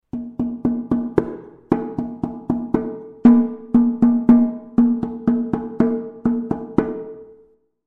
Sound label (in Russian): buben, khakass